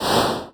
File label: whoosh soft